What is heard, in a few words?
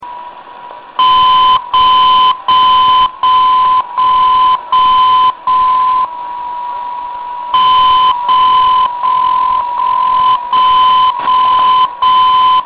unid 4499 kHz; Unid on 4499 kHz: 7 beeps / 1 beep / 1 dot